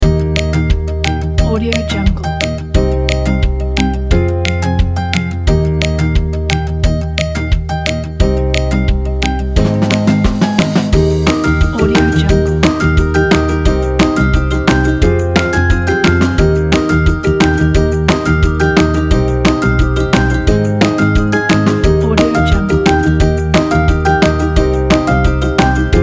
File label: happy baby